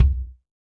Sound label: Ludwig Kick; Digital Sound Factory